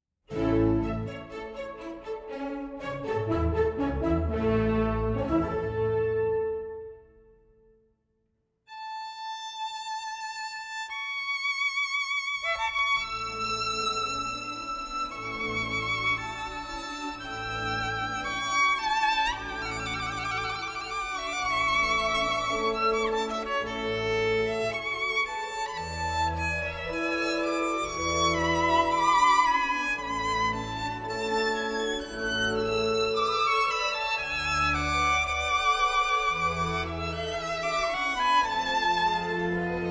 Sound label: Violin Duel